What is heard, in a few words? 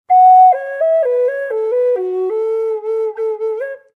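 f sharp minor concert, flutes; hi vol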